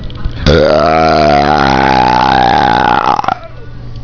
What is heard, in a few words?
Aaaaaaa!